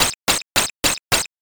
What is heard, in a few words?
flutter kick